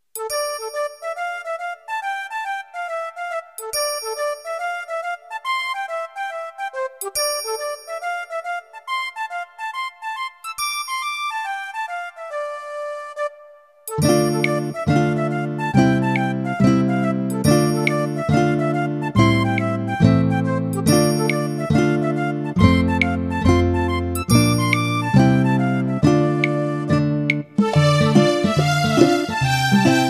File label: A Dockside Shop